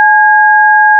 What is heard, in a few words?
dtmf c